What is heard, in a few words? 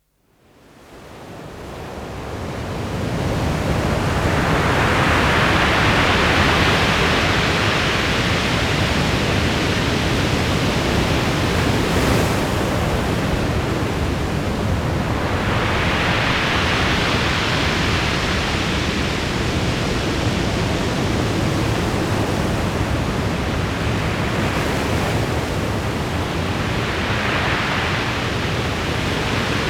Strolling On a Sandy Beach; Dr. Sound Effects; 210 Nature Sounds - 20 Hours of Relaxing Natural Ambiences for Meditation and Sleep